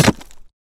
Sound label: pickaxe hit